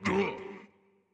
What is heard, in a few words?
Hurt; Dinkubus